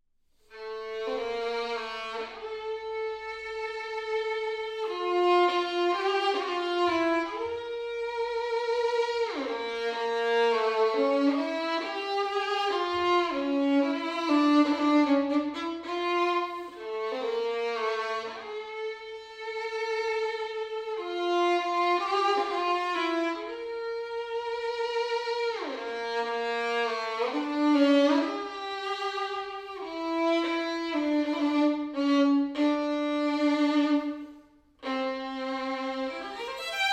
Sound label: Violin solo; Monti Chardash